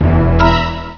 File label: Orchestra Clash; Mac Concentration 1.8